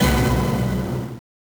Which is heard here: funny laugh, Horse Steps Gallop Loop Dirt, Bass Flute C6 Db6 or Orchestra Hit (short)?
Orchestra Hit (short)